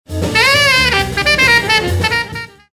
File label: sax